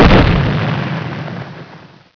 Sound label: Explode; skrap